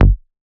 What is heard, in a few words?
Raw Bass G#